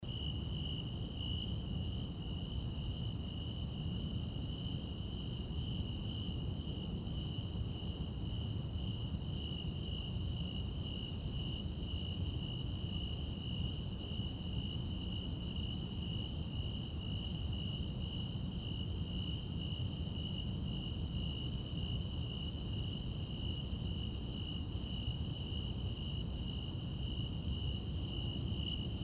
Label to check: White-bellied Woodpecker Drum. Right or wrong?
Wrong